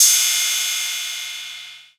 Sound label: ride trappy dirty; Crashes & Cymbals